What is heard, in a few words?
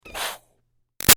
Steam Release Iron Chuff Muted; Miscellaneous Household Sound Effects; Big Room Sound